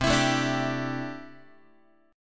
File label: C 6th Add 9th, C, down, Guitar; strummed; JGuitar